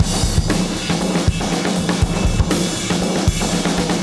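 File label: 119 bpm, beat loops; Zero-G; Monster Beats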